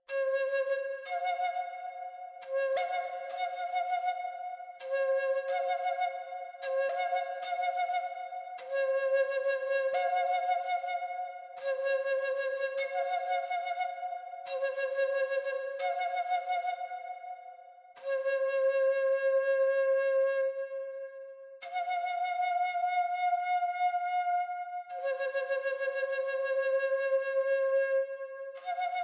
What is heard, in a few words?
native american flute